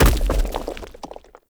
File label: Pillar Stone Medium Destroy Chunk; Objects; Destructible Pillars